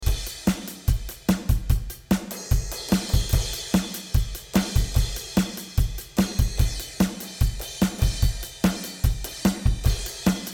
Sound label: Piezo Drums; Thremino